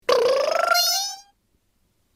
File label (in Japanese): ひらめき